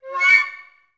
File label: FL FX, Advanced Orchestra; Best Service; Peter Siedlaczek's Advanced Orchestra